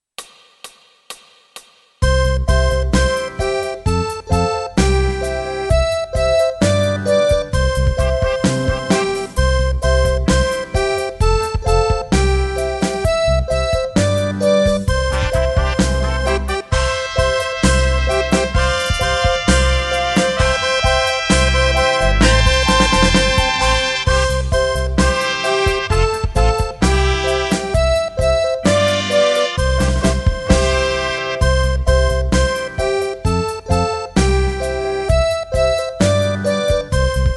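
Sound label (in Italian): Nelle vecchia fattoria, Fisarmonica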